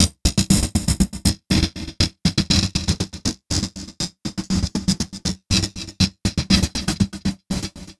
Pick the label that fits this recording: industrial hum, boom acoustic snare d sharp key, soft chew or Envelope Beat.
Envelope Beat